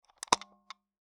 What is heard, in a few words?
Taxi Meter